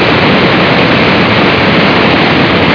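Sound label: waterfall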